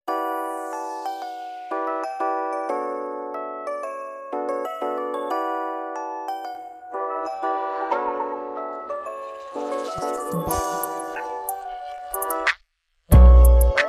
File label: instrumentall